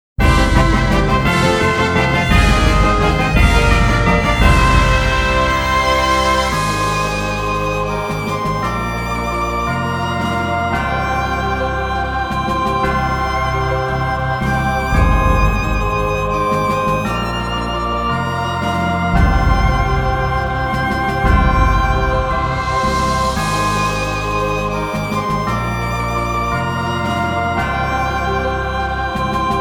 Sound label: Victory!; tales of destiny director s cut original soundtrack